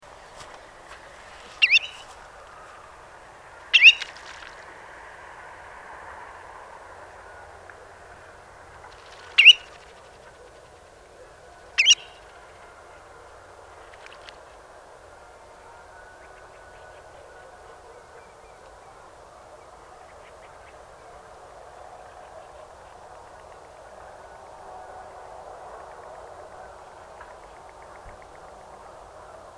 chevalier arlequin, chant

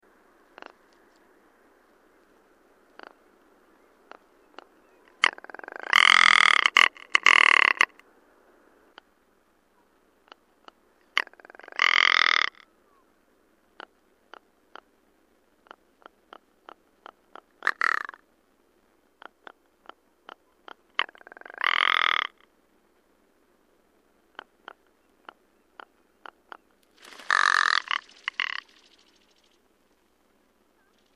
lagopede alpin, chant

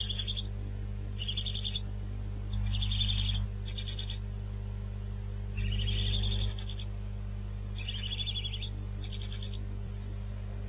2 jeunes, chouettes, nichoir; chouettes d'Hémilly; videos clips pris depuis le nichoir des chouettes d'Hémilly

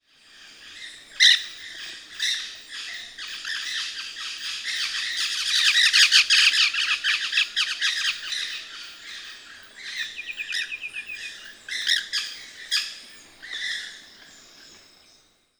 conure a ventre rouge, chant

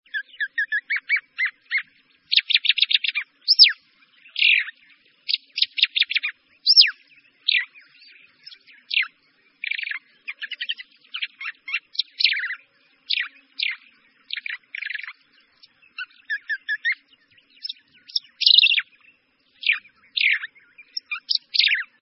mahali a sourcils blancs, chant

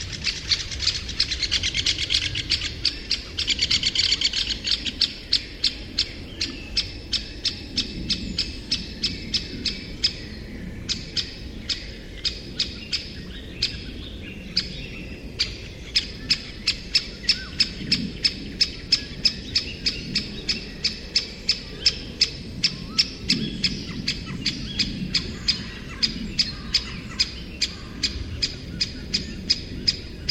pic epeiche; chant

